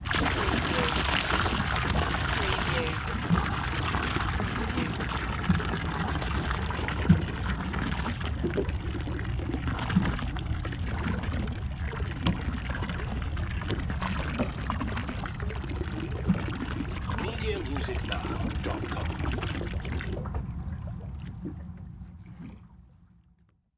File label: Boat Bilge Pump; sound-effects-bible